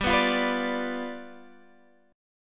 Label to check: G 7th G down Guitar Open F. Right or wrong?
Right